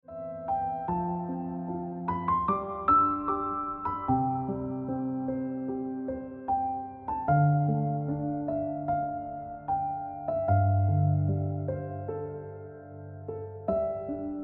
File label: Ringtone Piano Music